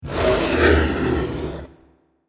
Huge Paper Rip Transition With Reverb; Scary Soundscapes; Crafted Signals

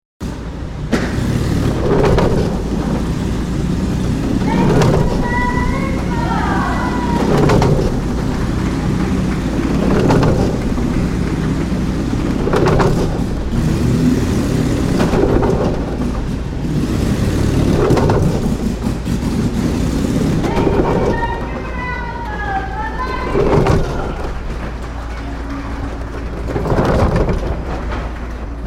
Wall Of Death, Motorbike Wall Of Death sideshow; Motorbike Wall Of Death sideshow as the bike rides round the wooden surround with show host and audience noise; Motorcycle Sound Effects

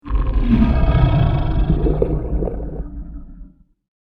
The Golem Awakens, monster sound; fantasy sound effect; Andrew Fleming; Twistedtracks

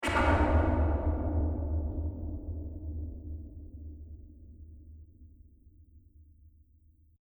Distant Impact; Distant Ko Impact; Miscellaneous Impact Sound Effects; Andrew Fleming

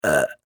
Human Female Burp; Burping (Sound Effects:Human)

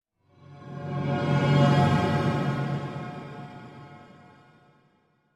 Whoosh Transition Musical Magical E minor; Ethereal; Sound Design; Whooshes

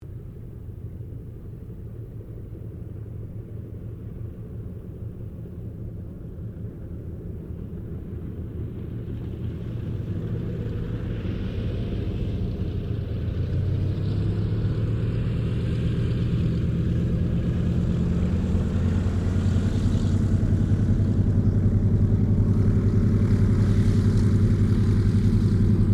AIRCRAFT DOUGLAS DC3 TAXI; Qantas 1942 DC3; Airplanes (Sound Effects:Vehicles)

